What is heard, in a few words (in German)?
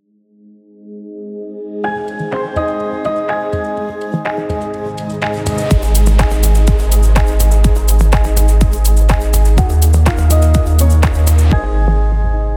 Musik aktivierend; Mein Schiff; BrandDance 2 15s